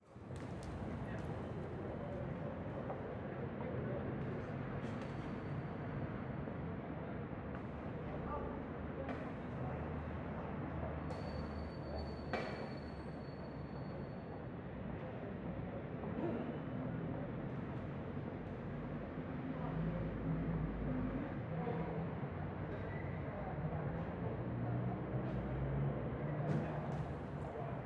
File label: Heist SFX Loop